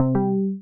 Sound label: receive; src2pkg